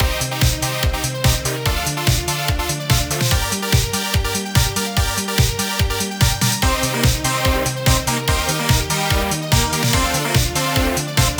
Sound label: neon sign circuit bpm145